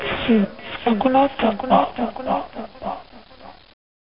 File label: die; votemap; overflow